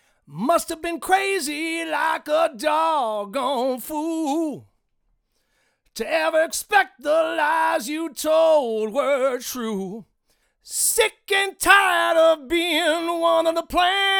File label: male vox dry